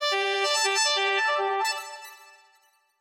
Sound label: Sax; Special-Photo Impossible-Triangle